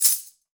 SHake

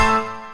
Piano Hit Synth

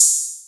openhat; Open Hats

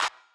Claps

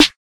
motorsport, Snares

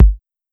808 Kick

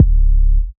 808's

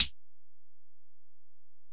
Hit, Closed Hats

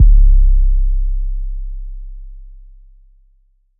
808s

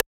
Percs

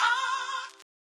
Chant

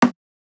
perc

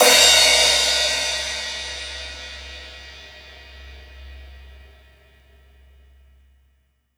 16 inch, Crashes & Cymbals; 16inchm

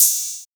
HiHat, closed hats

